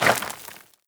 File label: scuff, footsteps, gravel; player